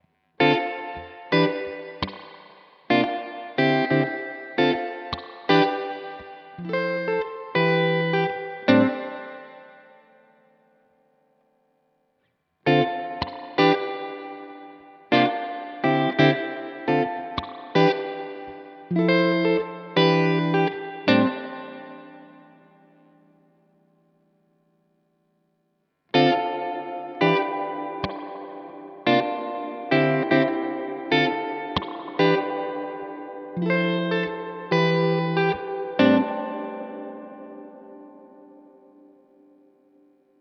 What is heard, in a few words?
uafx golden reverberator